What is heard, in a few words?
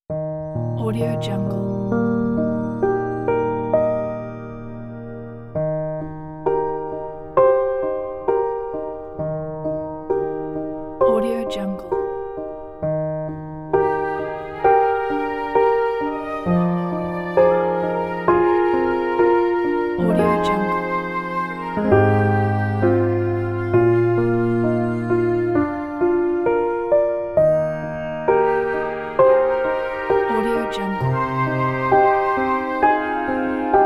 inspiring springtime